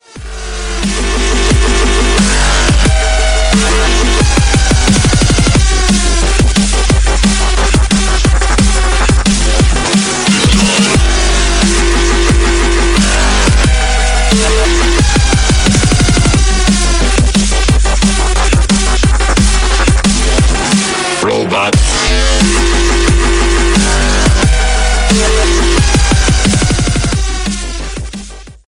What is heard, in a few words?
Dubstep